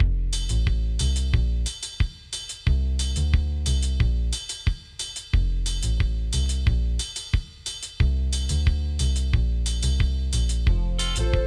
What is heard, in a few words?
Beats Me (Slow); SpongeBob SquarePants Lights, Camera, Pants; playstation 2; gamecube; xbox